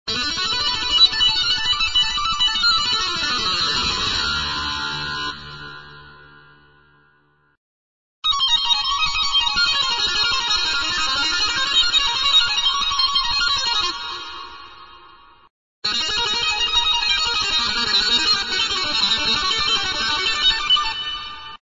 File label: metal run